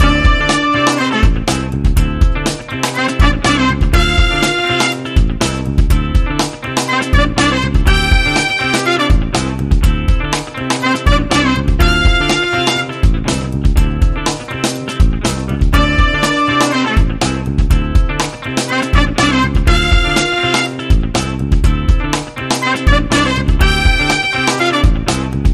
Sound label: Funky Horns